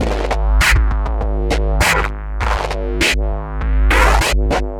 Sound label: Noise Beat; Drums & Drones